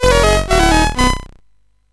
Pipe Mania, CSG8580R5

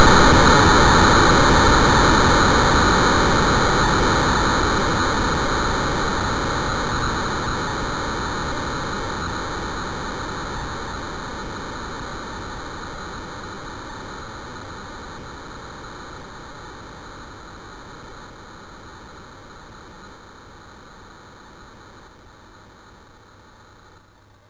Frantic, MOS6581R4

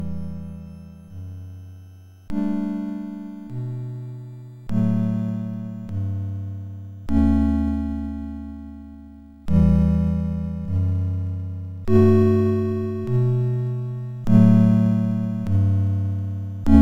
sid, MOS6581R4; Sweden

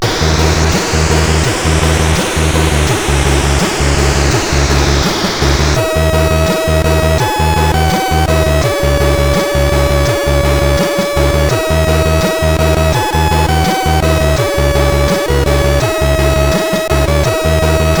music, sid CSG8580R5

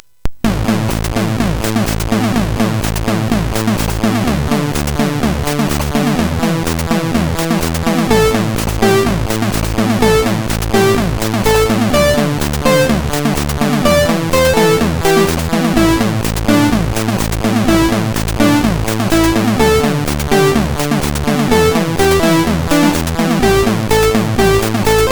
Beat Dis, sid MOS6581R4

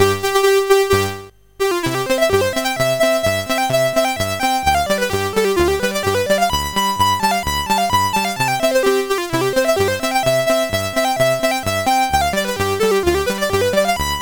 Clarinet Polka, CSG8580R5

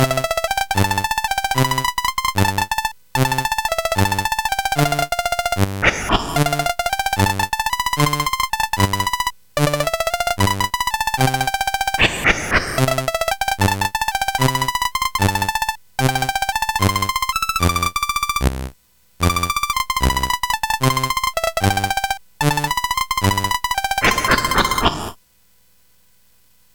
Ducks Ahoy, CSG8580R5

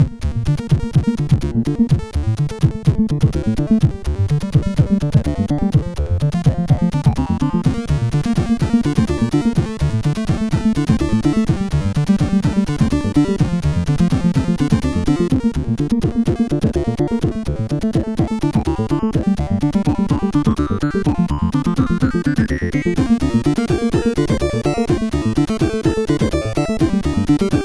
sid 8580R5

